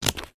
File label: Scissors cutting carboard